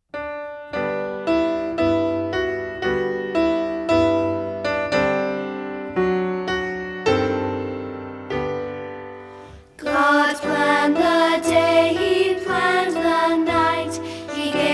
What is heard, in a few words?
because god loves me words and music